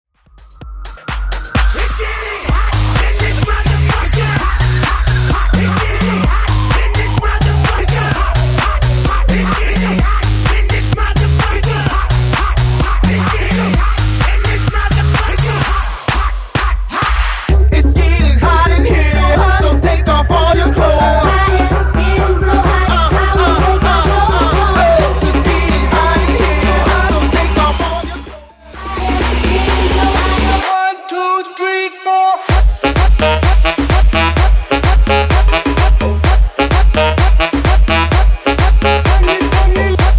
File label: Afrobeat Bootleg 128bpm